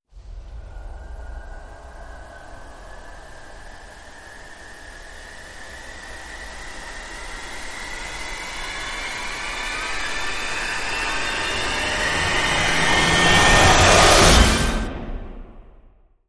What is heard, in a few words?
Rise Takeoff